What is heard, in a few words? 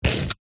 rock or stone single hard scrape cut score knife; foley; zapsplat